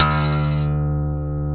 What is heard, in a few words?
E, funk guitars; Spectrasonics; Hans Zimmer Guitars VOL-1; TAKA FUNK